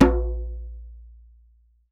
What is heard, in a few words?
Djembe low; Convolution; IR Samples; Unusual